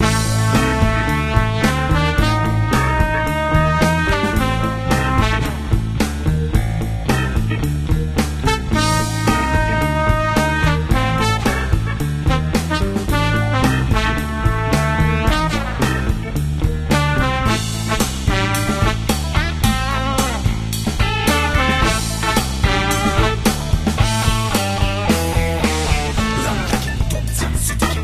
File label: Arcade Looped